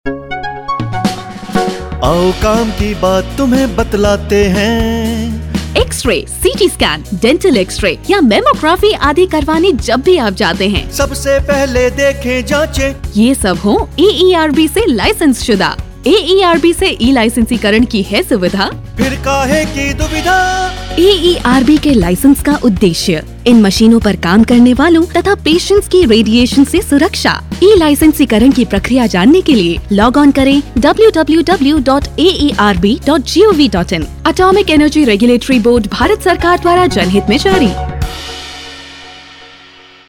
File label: Jingle Hindi